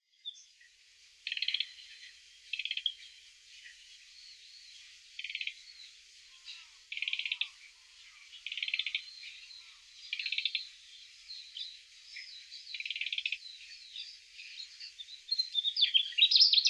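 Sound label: mugimaki flycatcher, hyogo; koe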